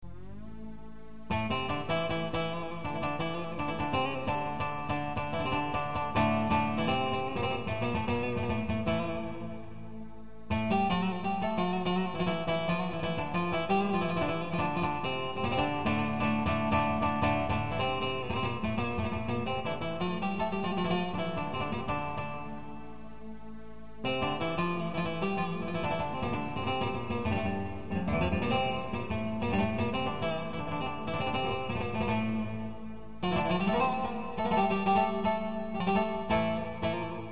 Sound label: Buzuk